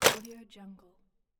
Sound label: plastic and glass clutter impact